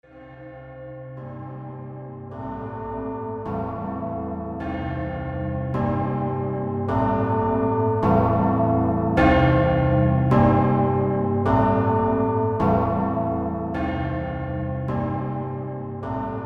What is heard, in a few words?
ringtone; parsifal